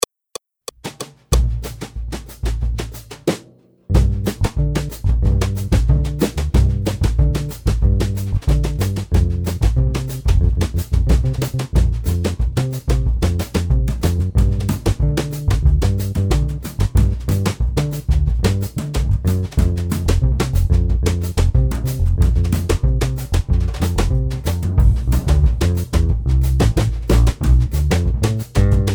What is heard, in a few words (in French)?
Accompagnement seul